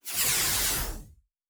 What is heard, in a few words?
Synth Whoosh, Sci-Fi Sounds Movement